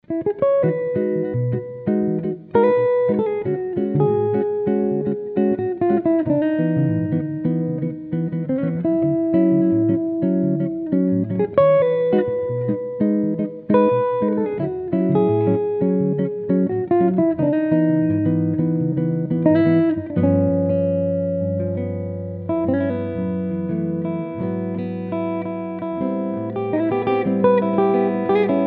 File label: 16" Swiss Spruce / European Maple Archtop; Ori Ventura / Ken Rose Playing His 16" Swiss Spruce / European Maple Archtop